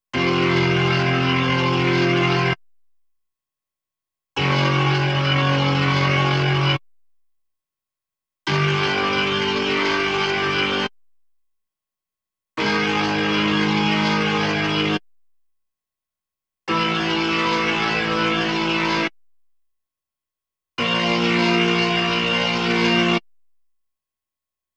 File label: B3 Organ; Masterbits CLIMAX 8